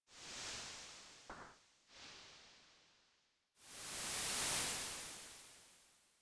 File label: silent forest; adarkroom